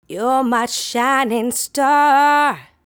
You're My Shining Star, Female Vocals

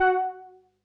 505, String Machines

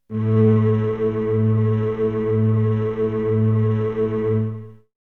Synth; ZERO G - Datafile DISC 1

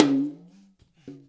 TALK DRUM; InVision Interactive; Percussion Slam

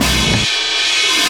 BANG, Anime Sound FX; COMIC

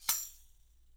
TAMSHA, EFFECT CYMB, 3D Drums; 3D Sound

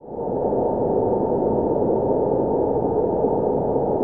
AMBIENT DRONE; Zero G; Malice In Wonderland

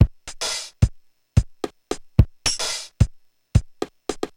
Korg MR-16 (110BPM), beats

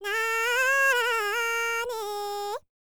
BULGARIAN, World Voices, BALKAN

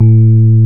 PNO, Rhodes Mk.V; Keyboards of The 60's and 70's

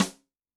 Sticky, Snare; Yamaha DTXpress-II

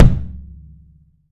KICK, 3D Percussives, Studio Kit; E-MU Producer Series Vol. 5 – 3-D Audio Collection

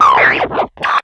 BLURP HI, Hard & Loud Techno; USB Soundscan vol.01; AKAI

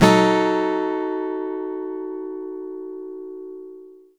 OVATION; Best Service; Alpine Volksmusik

